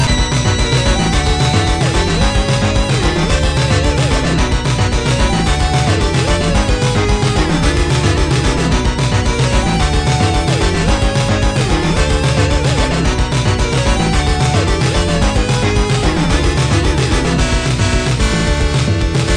high score

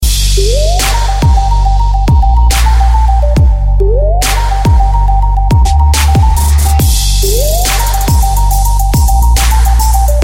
Trap Flute

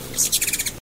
hummingbird chase

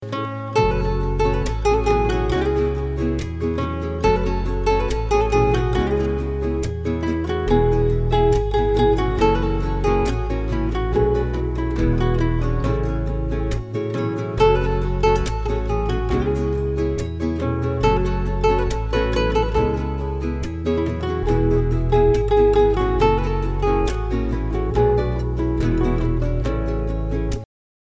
Guitar

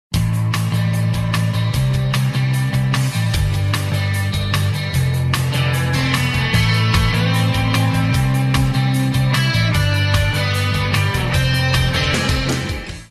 Electric Guitar Ring

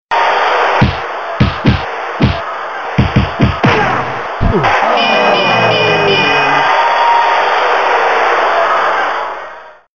boxing, hip hop ringtones

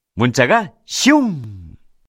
Text Tone

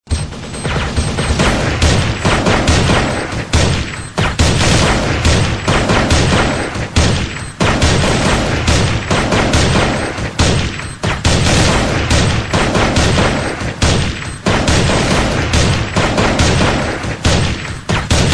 remix; counter strike

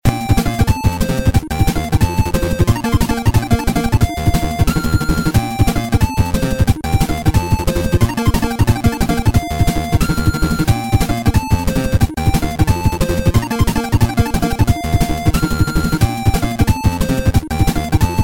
8bit; Kirby

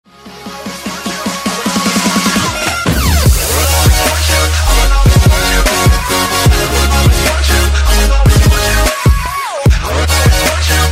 rewind remix

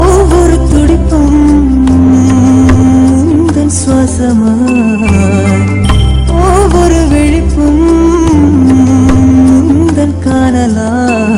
tamil touching song, ringtone

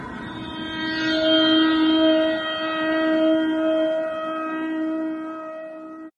Countdown Horn

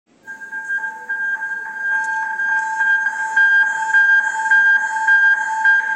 Station Alarm

